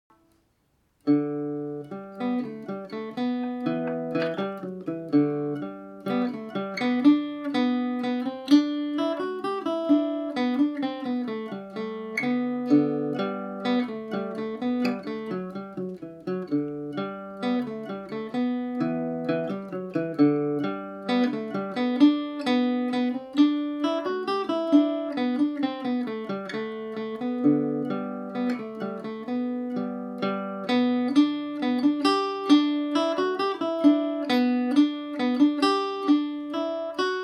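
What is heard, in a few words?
G major, irish mandolin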